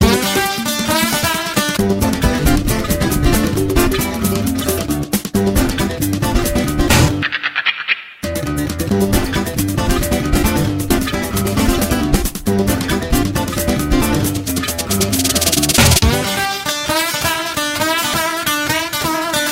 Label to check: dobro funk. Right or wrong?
Right